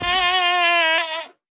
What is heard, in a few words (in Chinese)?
山羊尖叫死亡声